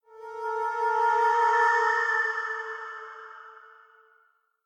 MUSIC BRASS WRONG

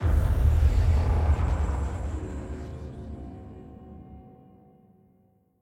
Token Unlock; Devour

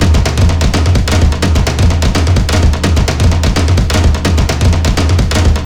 drums

